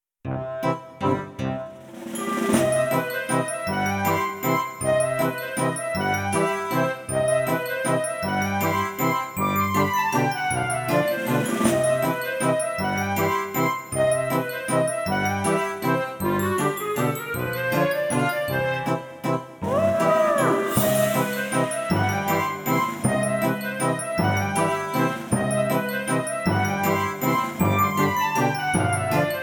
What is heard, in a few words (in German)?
Musik; Pechfingers Pudding; Cornelia Funke erzählt von Bücherfressern